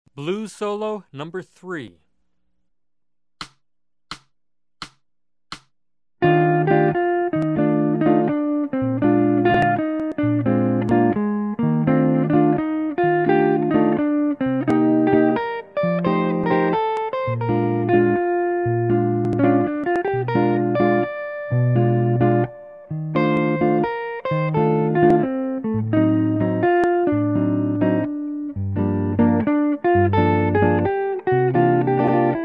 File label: Jazz